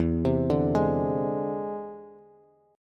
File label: E Augmented 7th Bass; 12,15,12,13; arpeggiated